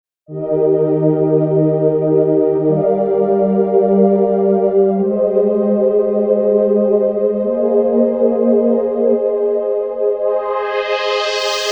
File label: Tape Str and Mellow clone